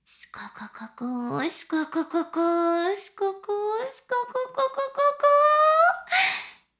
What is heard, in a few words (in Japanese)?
しこしこ音声, ASMR; 少尉; 牧場戦腺